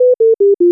sentry whine; snds jack gmod

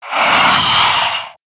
idle, zomb female; nmr

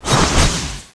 charge idle; cso; runebreaker

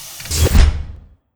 unseal; snds jack gmod

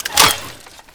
flame out, flamethrower; kf2; weapons; trapper

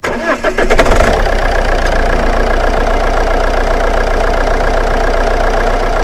idle, alfa romeo

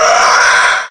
attack hit, bloodsucker

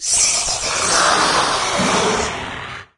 idle, maggot; doom3

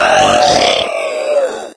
death, maggot; doom3

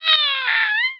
die, zombies

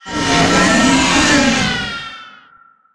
spider threat

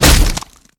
damage

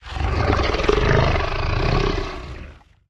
idle, bloodsucker

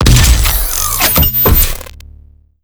gauss fire; doom gauss

